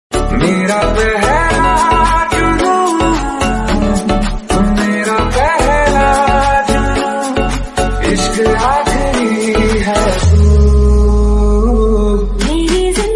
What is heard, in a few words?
new ringtone